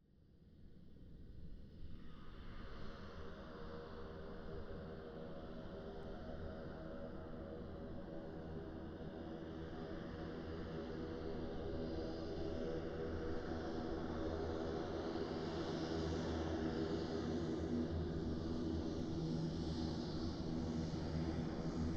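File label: beechcraft king air 350 exterior take off up by take off away right 25m; Beechcraft King Air 350i 2010; Pole Position Production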